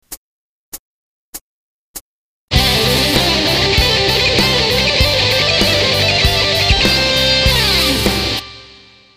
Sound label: lick, guitar